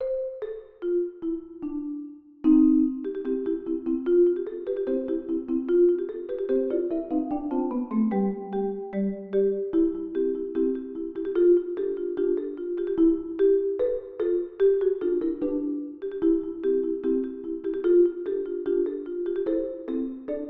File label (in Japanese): おもちゃの兵隊の行進（マリンバver.）